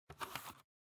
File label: Optical Disk Drive Insert